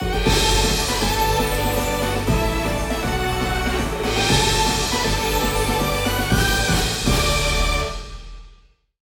Music Birthday; gamerip; mega man battle network legacy collection; ps4 switch windows

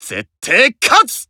Emo Warcry; disney star smash android ios mobile gamerip 2020

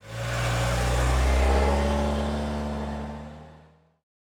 Car Go

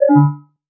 Stun

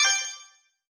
Toggle Off

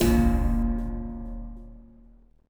Jingle Time Penalty End; Super Rub 'a' Dub; PS3

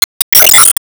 Enemy Jet Skill Shield Reflect

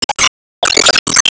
Enemy Ufoblock Active Shot; Disney Star Smash; Android, iOS, Mobile

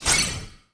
Return Shot Available

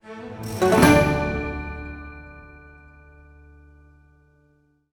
stinger Chest Opened; lego horizon adventures

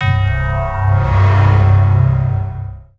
jingle failed small

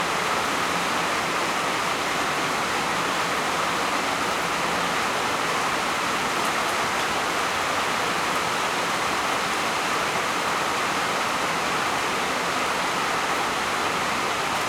heavy rain; heavy rain 2

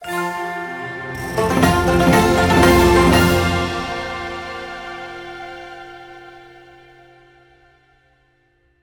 Chest Opened Jackpot; LEGO Horizon Adventures

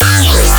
Bass Growl 200 G

